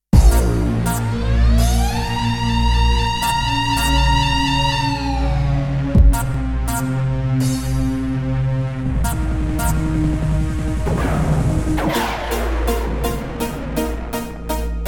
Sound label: rhythm